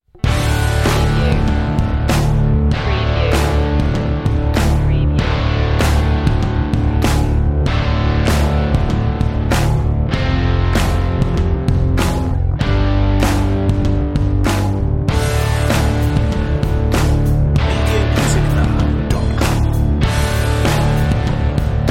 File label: texas rocker underscore